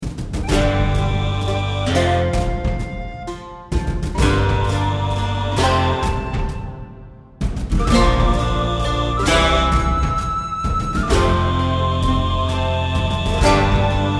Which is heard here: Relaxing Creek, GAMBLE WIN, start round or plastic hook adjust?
start round